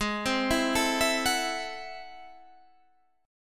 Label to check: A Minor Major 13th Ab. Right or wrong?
Right